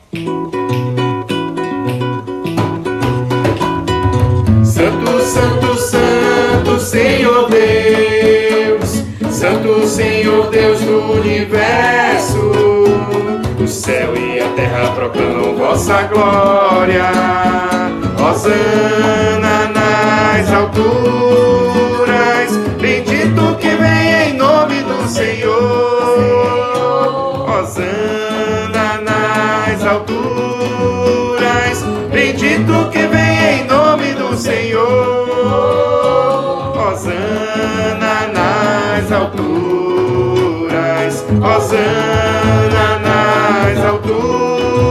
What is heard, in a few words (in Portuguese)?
samba; Santo